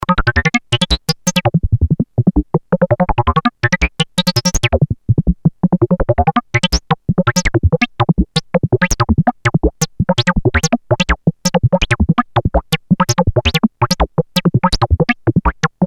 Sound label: EG & LFO